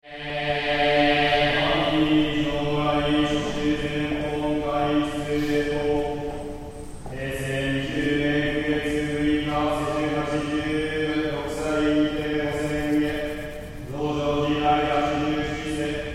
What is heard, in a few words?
Temple Monk Chanting Tokyo; Zinjo Ji; Monks chanting in Japanese temple, Tokyo Japan. Religious ceremony